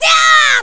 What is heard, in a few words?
Sword Spin; Link's big attack shouts (sword-spin, etc.)